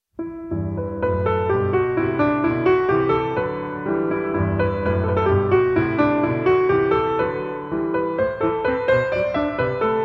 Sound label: Traditional God Rest Ye Merry Gentlemen (Arr. for Piano); J. Turrin